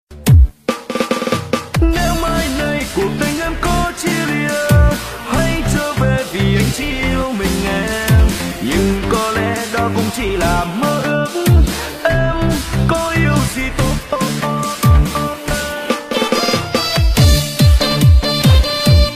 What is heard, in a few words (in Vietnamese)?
Nhạc Sống Remix Sôi Động Lk Nhạc Sống Trẻ